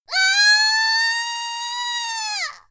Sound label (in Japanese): waaaaaa